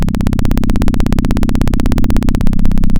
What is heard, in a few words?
Sound of a plane taking off